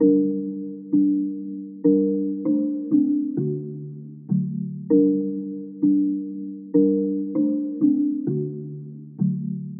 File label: 98 BPM G Min Mallets; Loop Stems; Cymatics; Cymatics Gems Vol 8; Czar